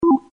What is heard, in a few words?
no sound; Caravan Beast